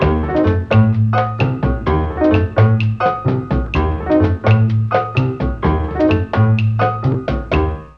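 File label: C#2 bass strings, Mellotron; BASSA+STRNGS; Mike Pinder - Mellotron Archive CD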